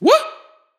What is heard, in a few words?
vocal words woo